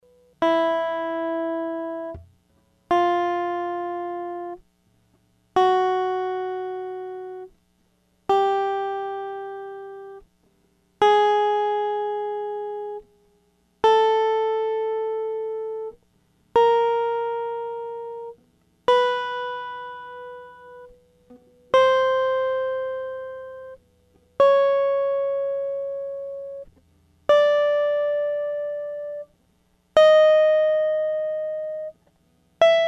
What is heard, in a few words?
yamaha fishman, guitar